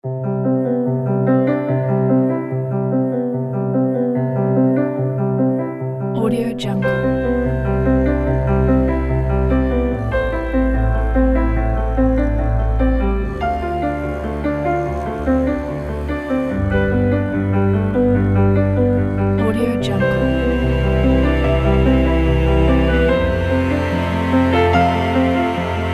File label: romantic